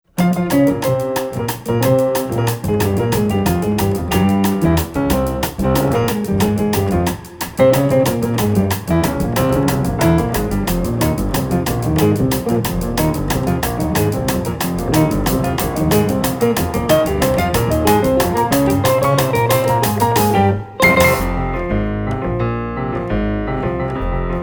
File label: Groove Jazz